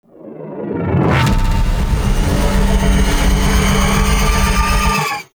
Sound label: Beam Warning; Enemies; Abilities; Ethereal; Warframe; Zariman; Void Angel